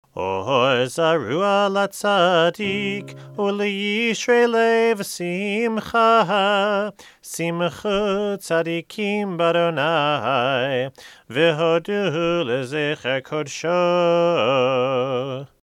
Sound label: chanting; red book; or zarua